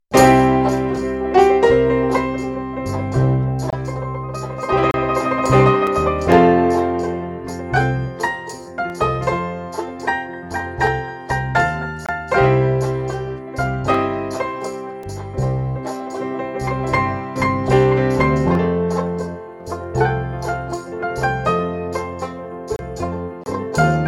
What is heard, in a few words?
nude loop; Nude Loop #1; Nude Loops